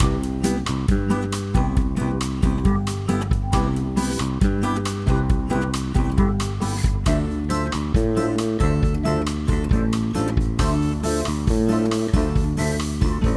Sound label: bossanova; Summer Catchers